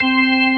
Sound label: B3 FULL; E-MU Formula 4000 Series Vol. 5; Protozoa; Vintage Presets